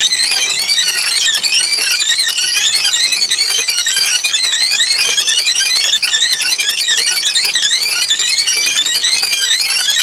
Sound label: high pitched rusty mechanical squeaking